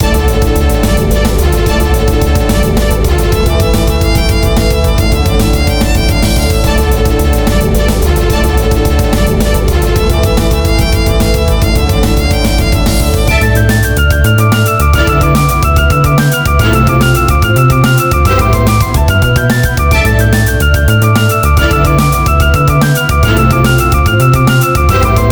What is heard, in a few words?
bagpipes